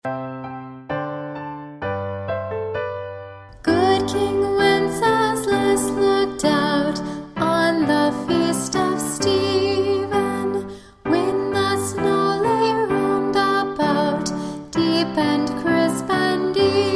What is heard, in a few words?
good king wenceslas vocal